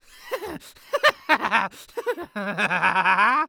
Cartoon Human Male Evil Laugh Goblin

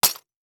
Impact Ceramic Debris Hit By Plastic Tube

